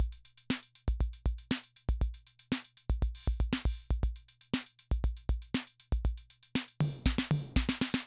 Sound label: Loop, rhythm 55, classic machines